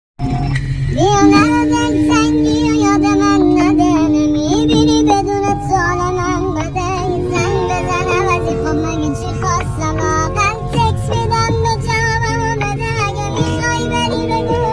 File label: ba sedaye bache